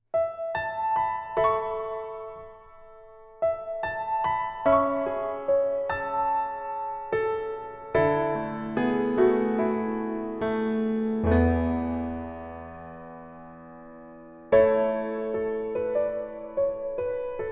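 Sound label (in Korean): Piano version 멜로디 MR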